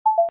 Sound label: goal